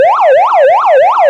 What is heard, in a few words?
yelp, sirens; code3 sprinter